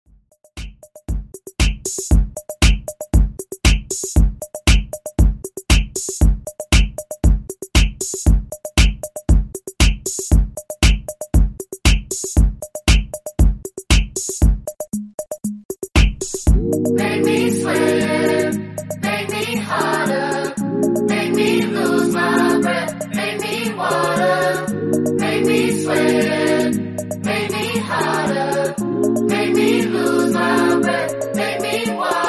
Top40 Redrum 117 Bpm

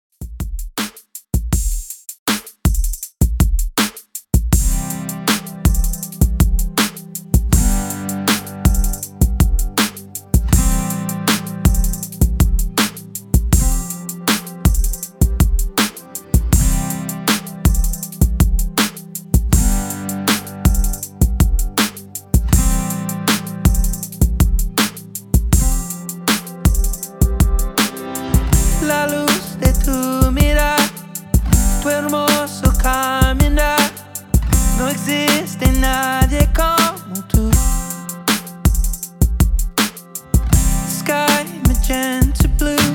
REDRUM 80 BPM

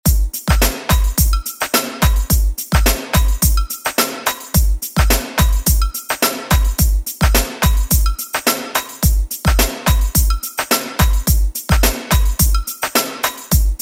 Extended Redrum

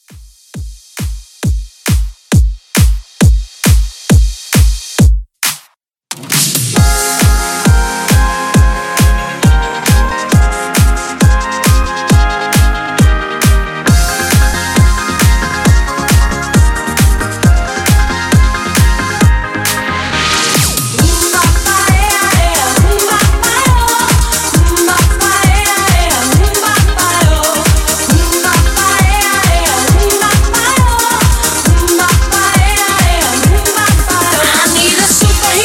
Dance 135 Bpm; 90s; ReDrum

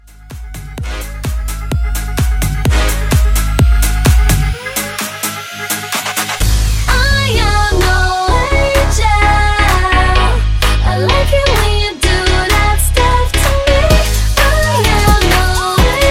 Hybrid Bootleg 128

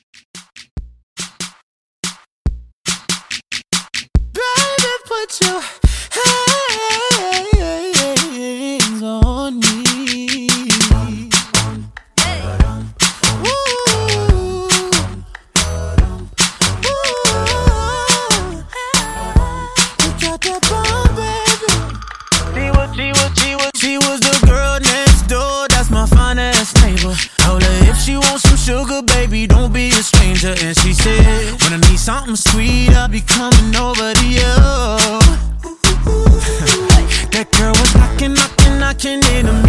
Mixshow Pop Re Drum 142 CLEAN

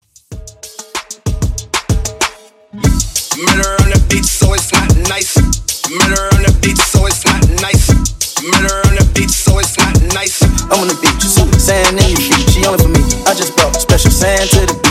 Top40 Redrum 95

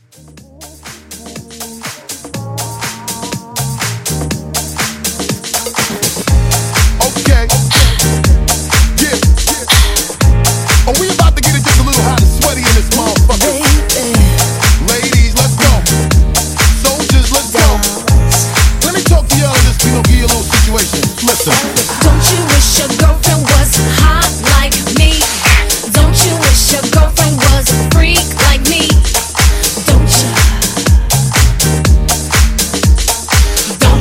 House Mixshow Blend 122 CLEAN

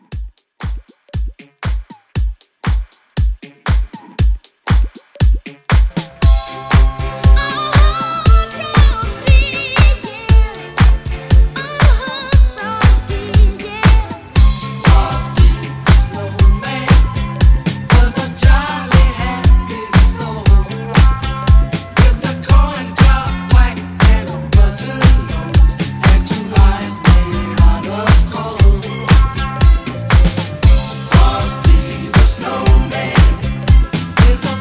70s Christmas Re Drum, 118

